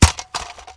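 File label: grass; Mafie 1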